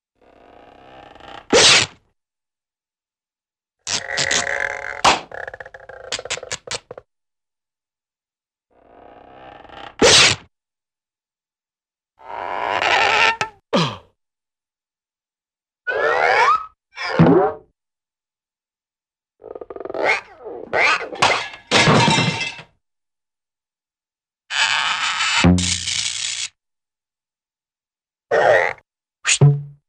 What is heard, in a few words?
Slow Stretch Sudden Rip Crash Windup; Cartoon; Hollywood Edge; Cartoon Trax